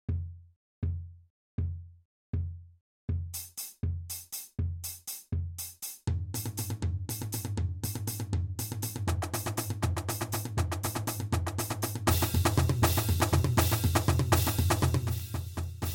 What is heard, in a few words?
jungle beat, drums